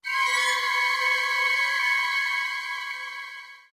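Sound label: Music Pipe Flourish; bolt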